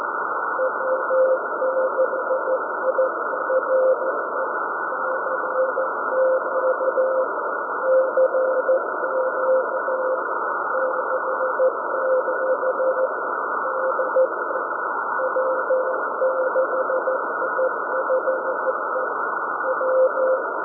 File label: CW; W6IFE